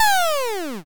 fall down

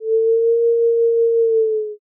erhu

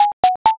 chip

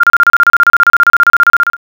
trainbell

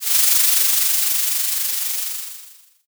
frying

